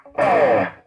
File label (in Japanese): グリッサンド, guitar